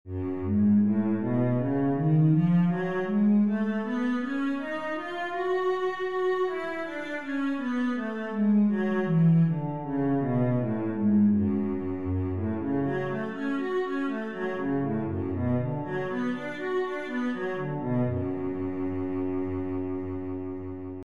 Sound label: f sharp minor, cello